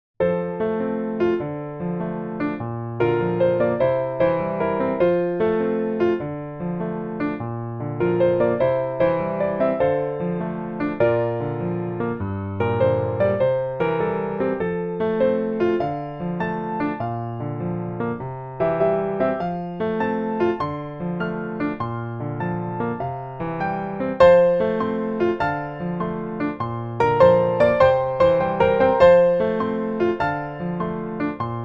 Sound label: for two Pianos